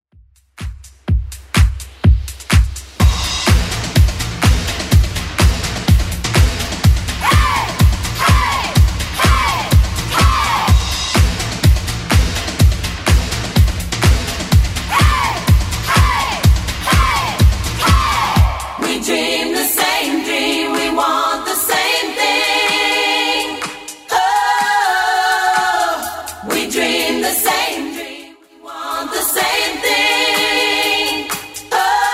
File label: Summer Remix 80s Club 125 bpm; remixplanet